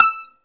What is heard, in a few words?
TZOURAS E 5, Mediteranean Traditions; Soundscan vol.53; AKAI